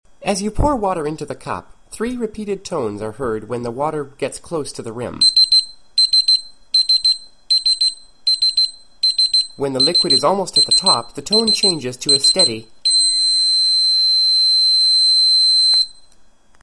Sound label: liquid level indicator